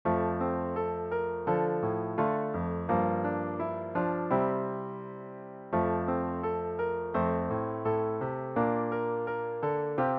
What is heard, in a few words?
Borrowed Dominant Melody